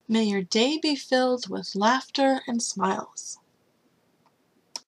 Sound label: laughter Smiles; May your day be filled with laughter and smiles